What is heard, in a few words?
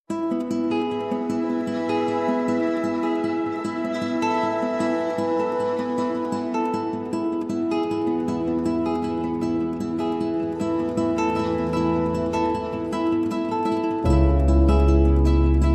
No Rhythm Guitar